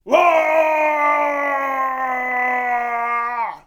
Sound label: Samurai shout